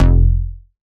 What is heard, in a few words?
Bass F, Bass Synth